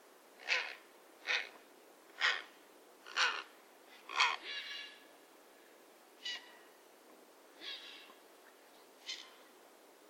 graureiher

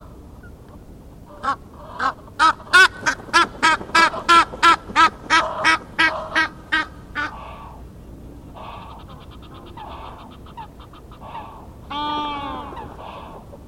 nilgans

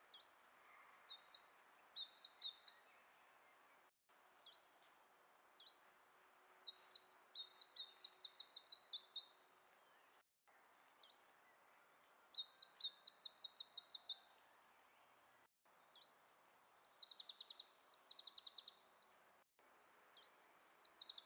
iberischer zilpzalp

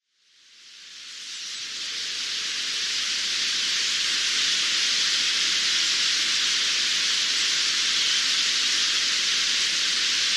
bergfink

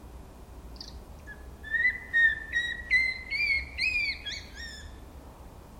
sperlingskauz

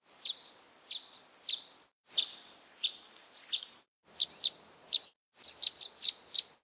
fichtenkreuzschnabel

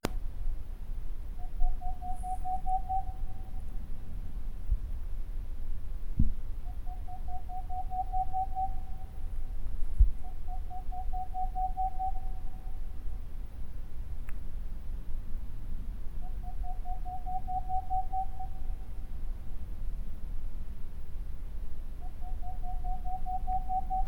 raufusskauz

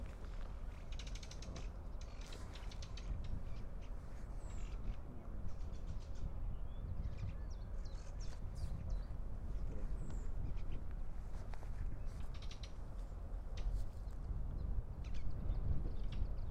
ringdrossel

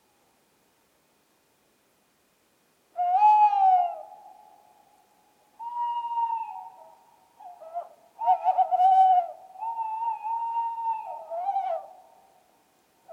waldkauz

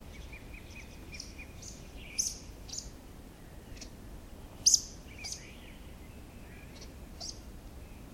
waldschnepfe

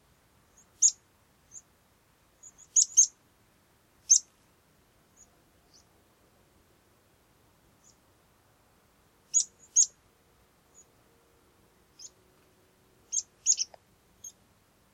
wiesenpieper

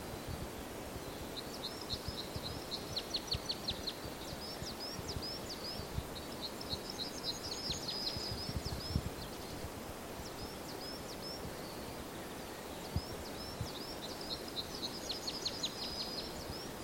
bindenkreuzschnabel

